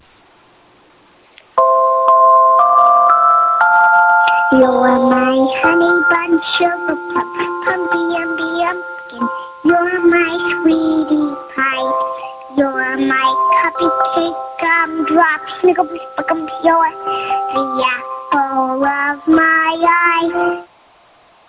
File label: Baby Singing